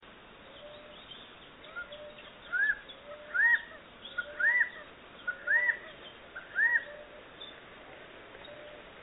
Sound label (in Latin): Callipepla californica; Ecología del Paisaje Sonoro